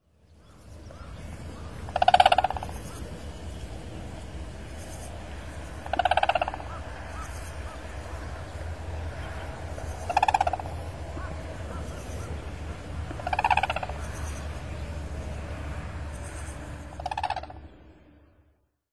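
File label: Red-crowned Crane, doto; タンチョウ; 警戒？; 北海道東部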